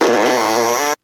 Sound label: fart short